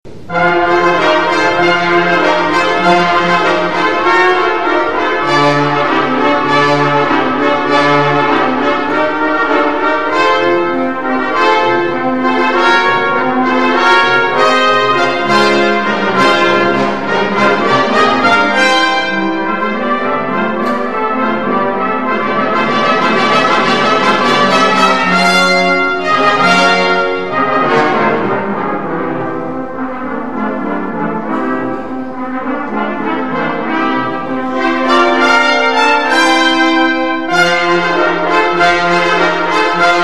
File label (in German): Fanfare